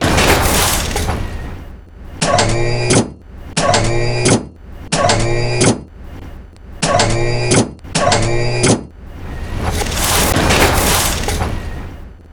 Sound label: stingray reload